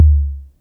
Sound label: SYNCUSSION